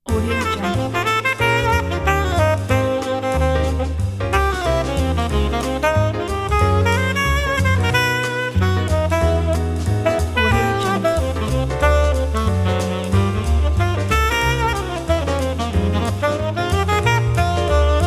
feel good happy jazz

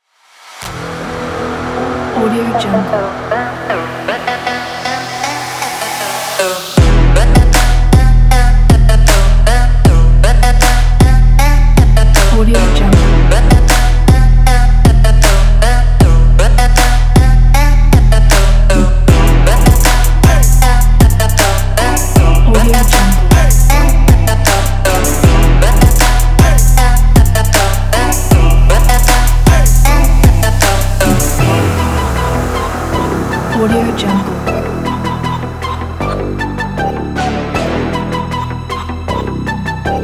it is trap

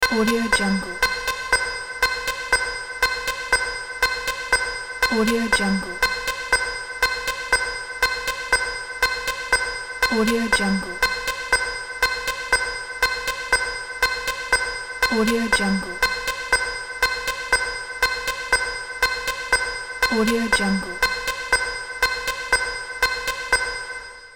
spaceship alarm

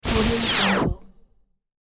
starship warp speed pass by

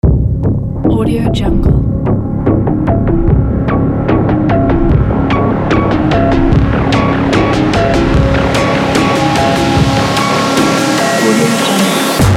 powerful cyberpunk industrial

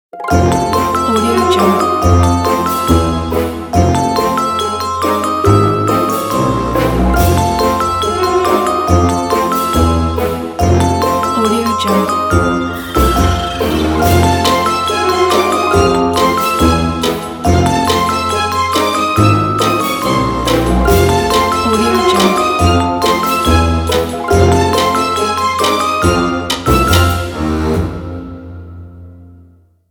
the halloween night

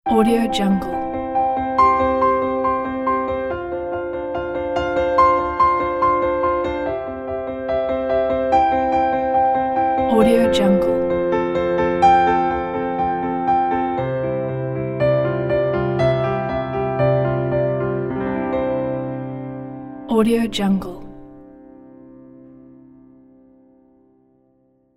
air piano ident

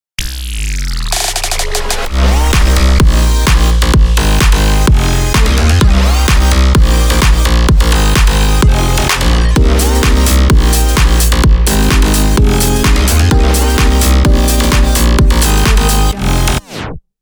electric party logo

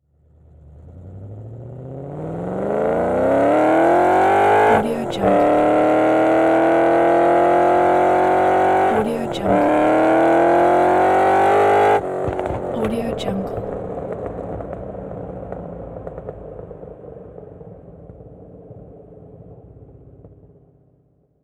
sports car engine inside interior

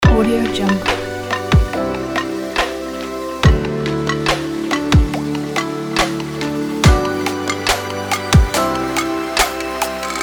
happy future bass

